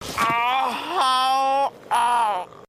ohoooooooohhh

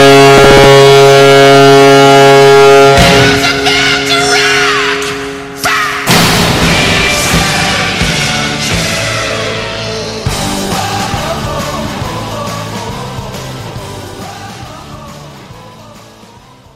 goal horn; cbj

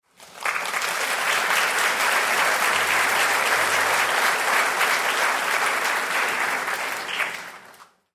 audience clapping; audience clapping pack 6

